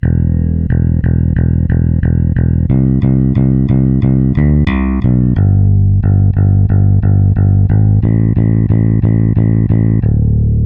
Tape

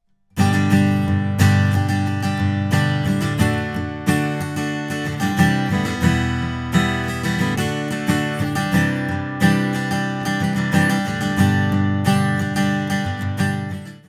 Acoustic Gtr

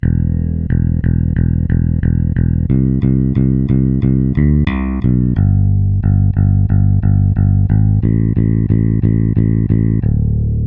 Warm

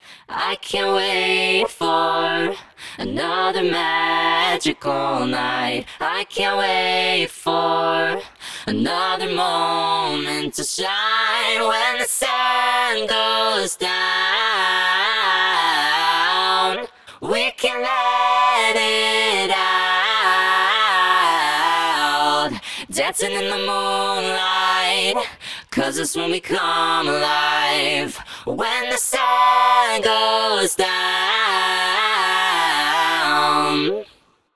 Harmony Voices Only

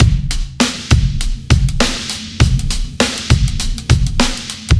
drum loop swaghop beat 2bar 100

